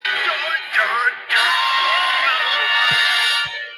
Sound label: dun dun duuuuuuuuuuuuuuun